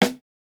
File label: snare, snare house